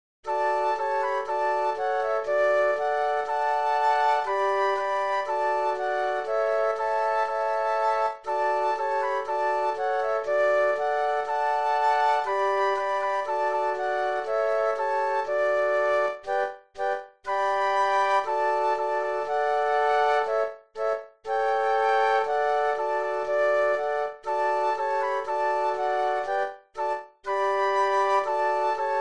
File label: Flute Quartet; christmas